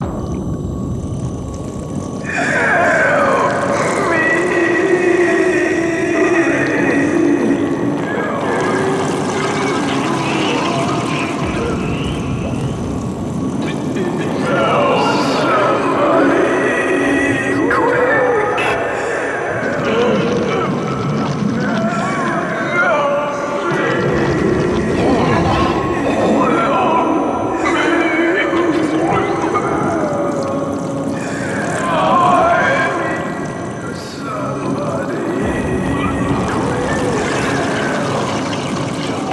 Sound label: Modern Male, English; fatal frame 20th anniversary celebration soundtrack